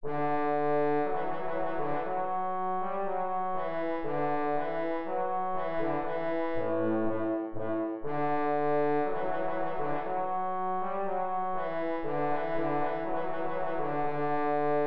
trumpet voluntary; Jeremiah Clarke

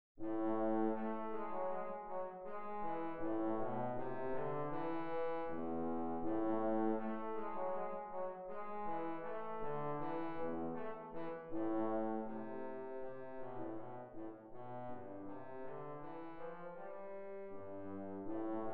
rigaudon mineur; telemann georg philipp

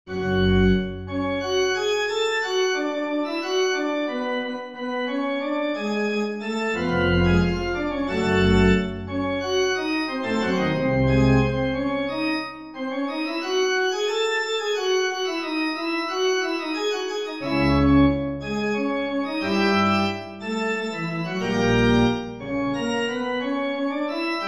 for flute strings; bach johann sebastian; recitative; des morgens aber hielten alle hohepriester

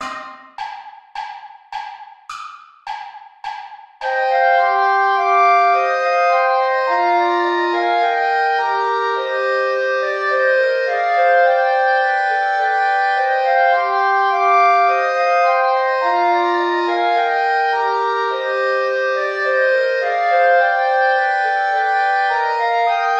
play along without clarinet

